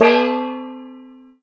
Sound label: clang; TamTamMicro.activity